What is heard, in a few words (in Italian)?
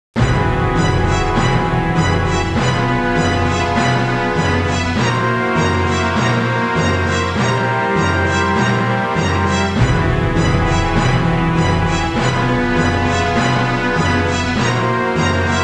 musica elettronica; electronic